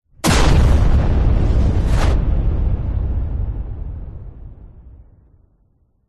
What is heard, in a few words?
explosion loud punchy powerful with a whoosh